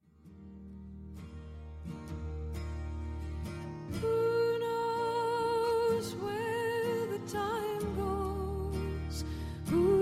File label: Chorus